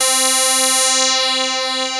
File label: 2600 LEAD C4, Analog; ARP 01; USB Soundscan vol.49; AKAI